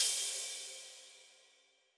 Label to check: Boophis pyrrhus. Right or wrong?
Wrong